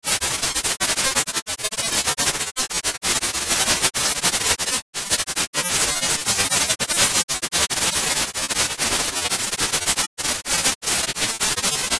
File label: granular test fm